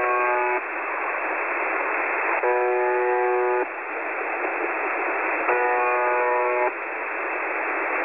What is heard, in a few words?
the buzzer